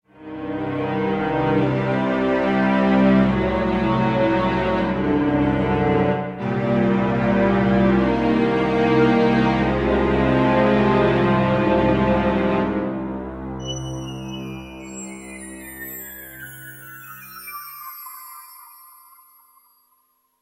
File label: Music; FMV